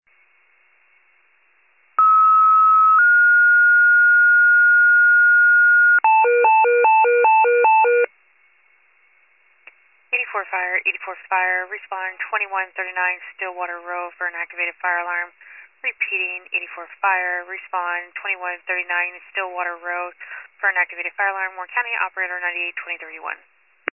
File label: FIRE pager alert